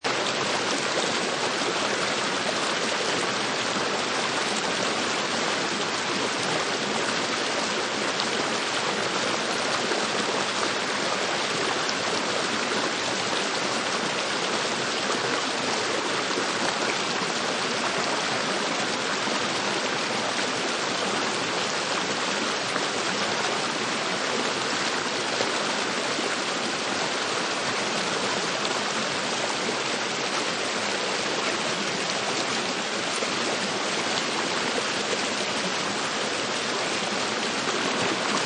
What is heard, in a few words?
River Loop, An evenly flowing, clear and trickling water stream flows gently; Nice and relaxing sound, seamless loop; Forest